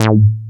A-BASS, AN BASS

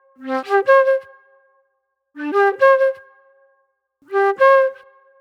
J-Funk Flute, Call And Response

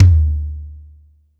Floor Tom H, Standard Kit, Yamaha PSR-E323

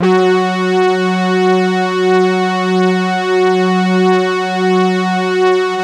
Obie Stack; Optical Media International; Sonic Images Library

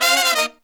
132, Pop & Funk Brass

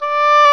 oboe, Orchestral Winds; Oboe f; Roland LCDP04

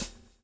SWNG

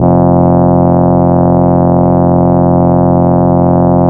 DIDG; CMP - Analogon

